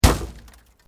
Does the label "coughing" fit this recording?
No